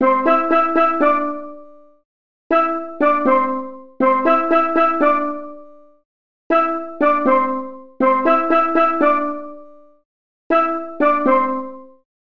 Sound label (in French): carillon